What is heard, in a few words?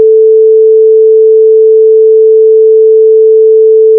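sine wave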